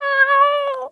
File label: cat idle; pets